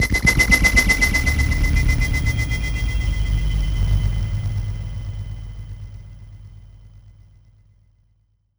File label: spook; One Shot FX; Sample Magic; Transmission-X